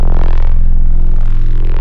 Spectral Growl, wavetables

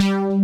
DNC SYN BS, DANCE; Pro-Rec - Synthsonic; Z1 DANCE

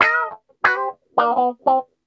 GUI, LICKS

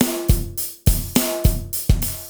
CB LOOP; Zero-G; Chemical Beats

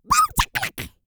YELL, Vocal; ILIO; Vocal Planet VOL-4

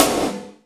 EFX SNR

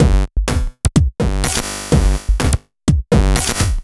Drum Loops, 125 BPM

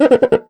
MIXED PERC; 90 s Sample CDs

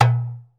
SI SOUROUBA, ETHNIC PERCUSSION; AKAI S6000 CD-ROM - Volume 5

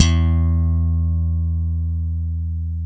SLAPS E3, slap bass

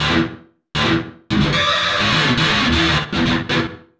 GTR LOOPS

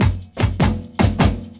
LOOP, 150 BPM; Ueberschall; Drum & Bass Flash Trackz

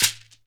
SHEKERE, Synclavier Percussion; ILIO

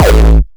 3xosc hardstyle kick

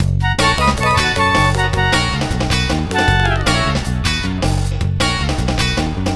Flutes & Swingers Full F#; Zero-G Kocktail Kollection; Construction Kitz (Lounge); Flutes & Swingers 156

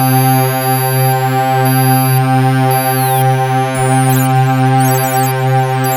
REPLICANTS, OLD SYNTHS; Rubber Chicken Software Sample Bank

